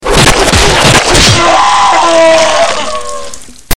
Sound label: knife stab